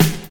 clear snare one shot e key, steel snare drum sound tuned to the E note